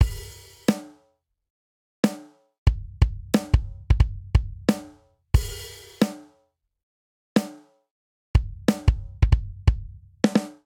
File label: Swing Hip Hop Breakdown 90 bpm; 4 4; MIDI Clips; Ableton; Factory Packs; Drum Booth